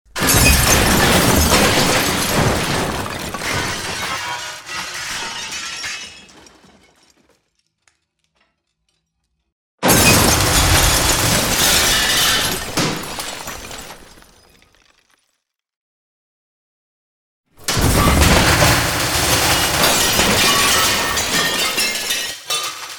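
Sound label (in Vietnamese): Âm thanh sụp đổ đồ vỡ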